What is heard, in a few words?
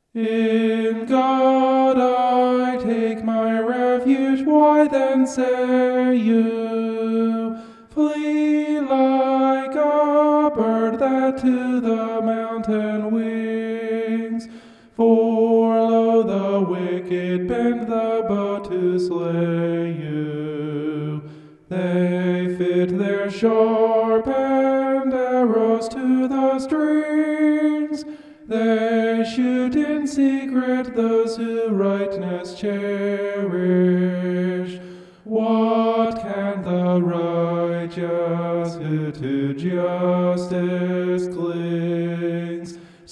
vocal homoph; psalm 11